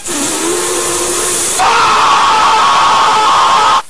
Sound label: fuuu